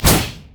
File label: arc; Diana